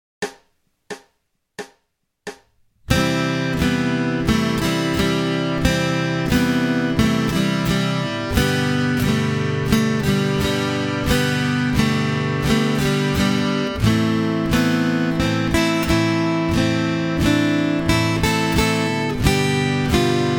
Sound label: Acoustic Accompaniment, acoustic guitar